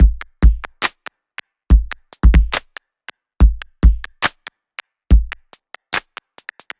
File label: Slip Break, Bpm 141, Dubstep, Drum Loops